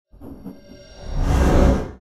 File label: Swipe